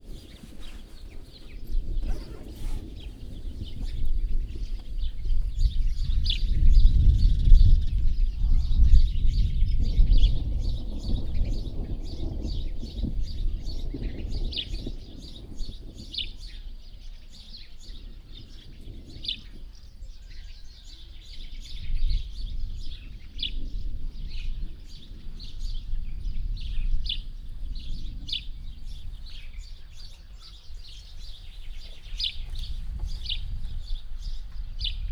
nagyon tavoli dorgesek szel; mennydorges

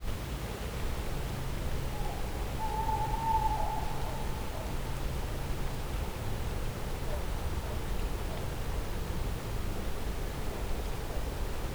éjjel távolban vízház, macskabagoly; cardioid; termeszetben

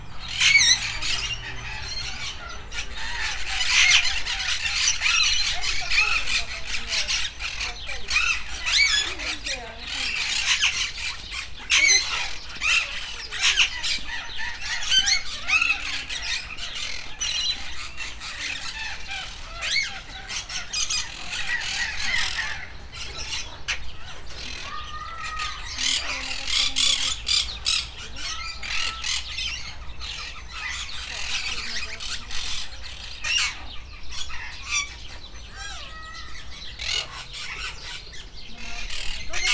az erős vijjogó hangot hallató, ritkán szólal meg, nagy sándorpapagáj; debreceni zoo 2012

